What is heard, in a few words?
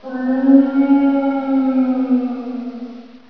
scary, ambience; XDM